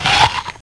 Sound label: chomp; Easy Personal Web Page; HOUSE